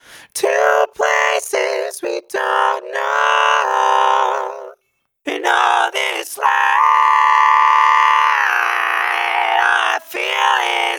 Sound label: Vocal Stem